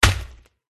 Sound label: attack contact middle